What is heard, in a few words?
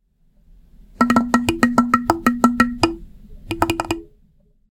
Log Drum Morta